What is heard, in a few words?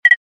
Access granted beep